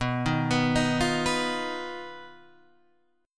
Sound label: B Major Flat 5th B, chord; BMb5 Chord {7 6 9 8 6 7} arpeggiated